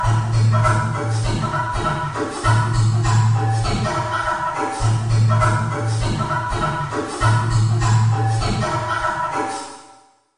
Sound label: Auditorium, Bata Drums, Wet; Virtual Room Reveb; Syntheway